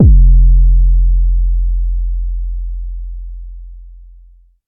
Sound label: phonk kicks oneshot - 808 f, Specially designed for phonk type beats, these nasty, layered 808 o; kick drum